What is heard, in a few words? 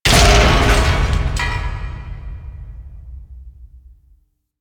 Metal Debris Impact; Mission Two; Warframe; The New War